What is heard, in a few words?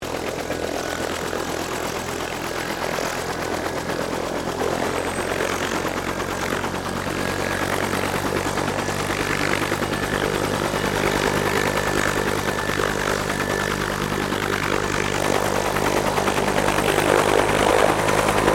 HELICOPTER 1967 BELL UH1 IROQUIOS PREFLIGHT; RAN UH-1 Iroguios; Vehicles; Aircraft; Helicopter Sound Effects; Helicopters (Sound Effects:Vehicles)